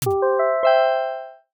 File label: mini reveal fanfare; video editing